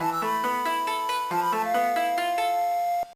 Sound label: Level 1 Clear; Microgame - Sliding Screen